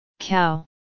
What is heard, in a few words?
cow, farm animals